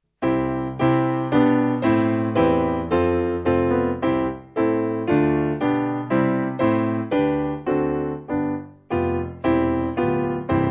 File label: Mainzer Piano; Author Of Faith